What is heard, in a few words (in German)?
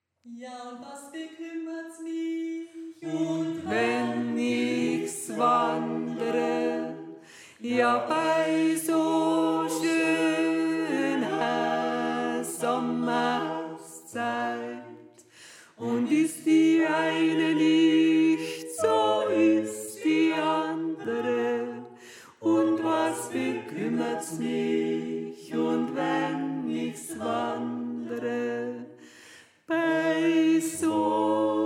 Tenor; Ja Und Was Bekümmerts Mich